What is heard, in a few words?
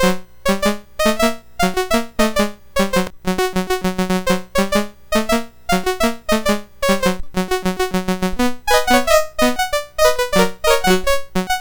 Curly Calypso BASIC, MOS6581R4; Latimer Joey